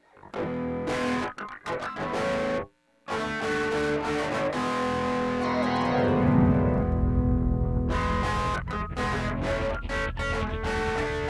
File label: Wah-Wah Yeah intro